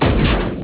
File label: shot; moorhenne